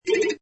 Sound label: Pinball Sound Tone; Video Game; Games